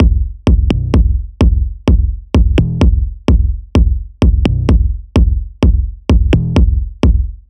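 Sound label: Bass drum; Sns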